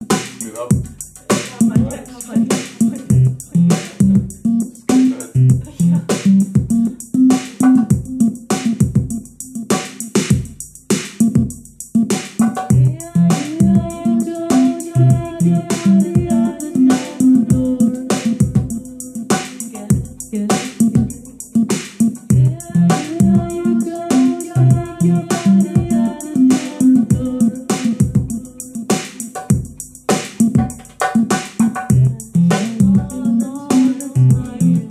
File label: clubbing